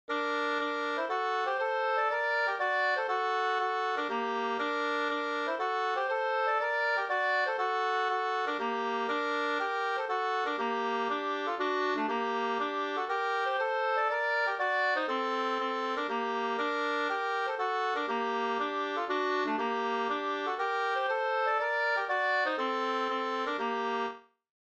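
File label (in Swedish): polska, tvåstämmig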